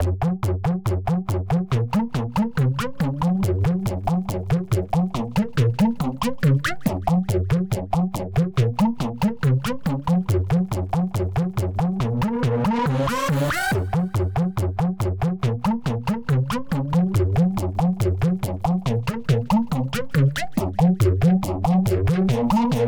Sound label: Techno Line